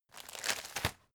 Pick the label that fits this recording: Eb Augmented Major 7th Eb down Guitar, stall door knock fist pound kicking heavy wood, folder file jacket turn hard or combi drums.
folder file jacket turn hard